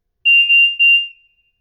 Industrial Mechanical Electrical Beeps Negative Alarm; Electrical Button; Tom Hutchings